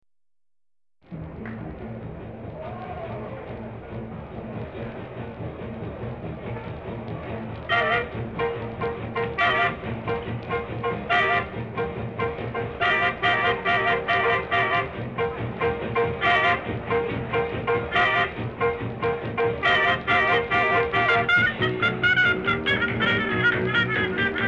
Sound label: Pluckin' The Bass